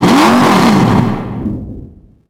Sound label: Rev; Cars; XBOX